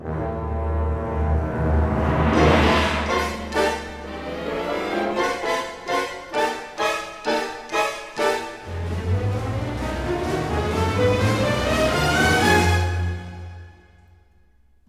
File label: TUTTI; East West; Symphonic Adventures